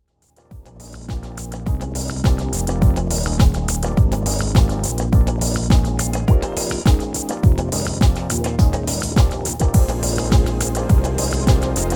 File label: Static Travel, ambient